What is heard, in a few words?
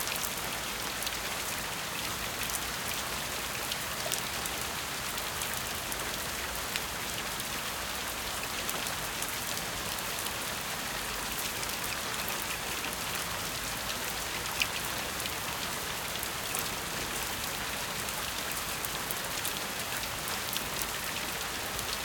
Amb Rain Loop Base